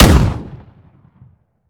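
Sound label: romeo870 fire player, weapons; gmod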